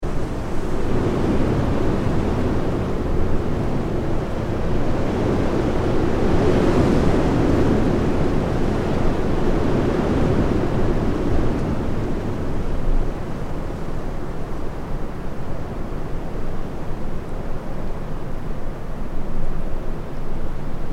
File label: weather wind strong trees; Wind - Strong Stormy Wind Through Trees